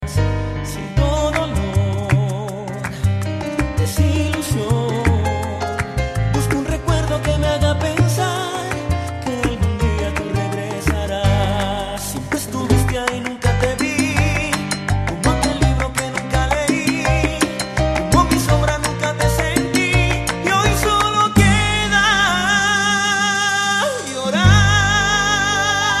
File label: salsa; Latin